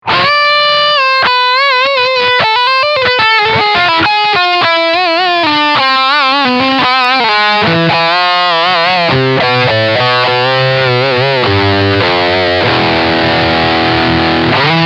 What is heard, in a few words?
Lick High Gain Steg Pickup